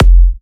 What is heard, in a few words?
F, kicks; markus hakala kicks